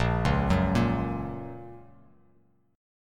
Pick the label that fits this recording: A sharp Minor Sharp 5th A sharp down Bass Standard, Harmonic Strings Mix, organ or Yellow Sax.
A sharp Minor Sharp 5th A sharp down Bass Standard